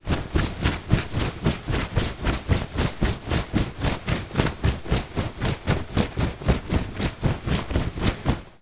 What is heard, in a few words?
foley shorts cloth run; zapsplat